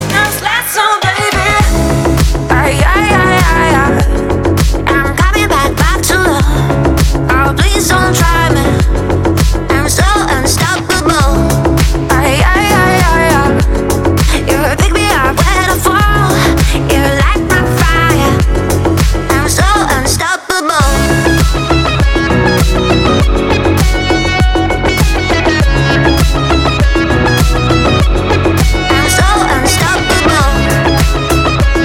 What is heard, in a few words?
organ